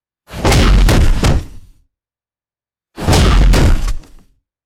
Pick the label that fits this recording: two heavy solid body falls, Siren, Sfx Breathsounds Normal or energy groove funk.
two heavy solid body falls